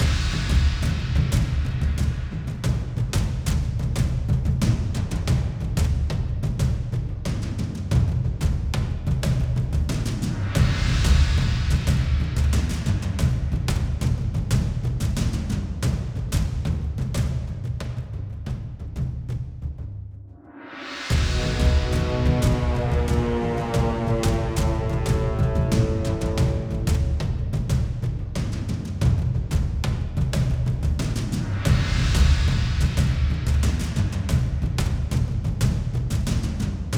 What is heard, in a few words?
Base Loop; bakugan champions of vestroia 2020; switch gamerip